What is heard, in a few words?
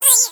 Slime Angry; Seer; GamigoNA